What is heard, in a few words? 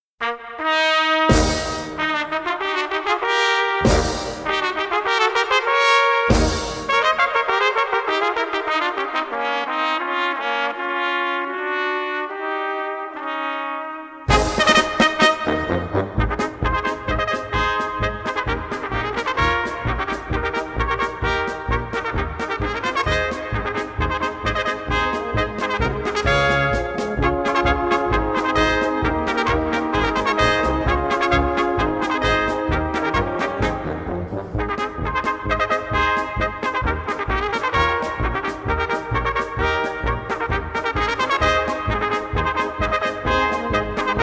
trumpet train